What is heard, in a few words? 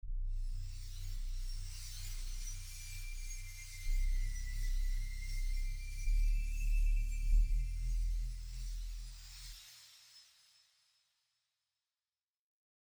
scary sounds